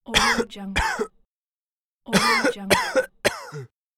cough

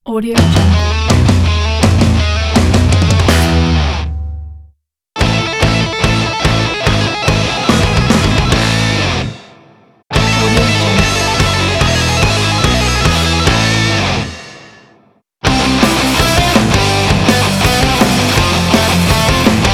punk logo pack; andywarner

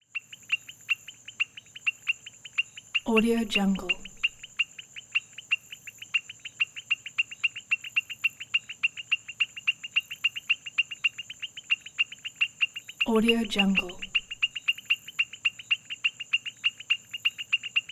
jungle frogs; prosoundfx

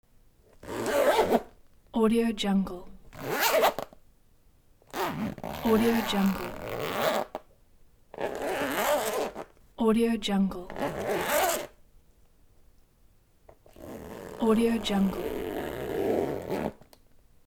zipper

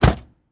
impacts source metal tool drawer punch; robotic lifeforms 2

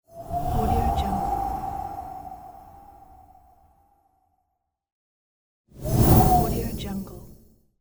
frost wind swoosh

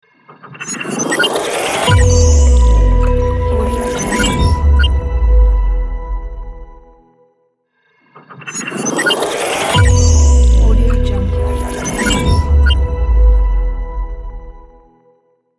futuristic elegant logo

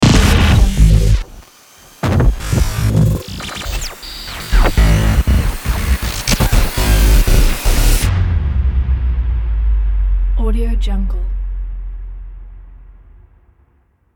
modern glitch logo; aaronwildemusic

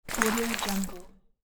gathering ice

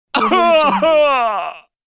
male surprised pain shout

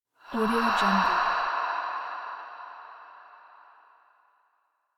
female sigh exhale

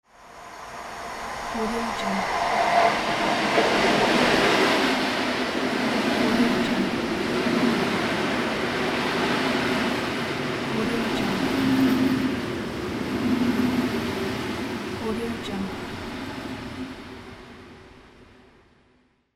train

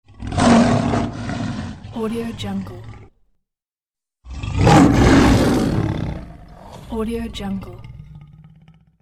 lion roar

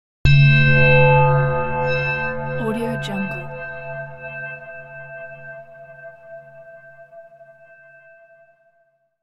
scifi bell

